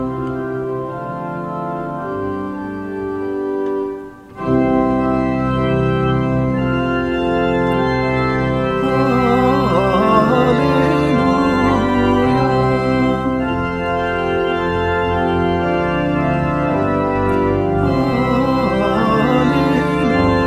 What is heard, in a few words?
Descant Reference